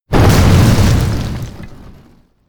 stone big impact hard

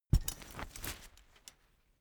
umbrella big close full hard

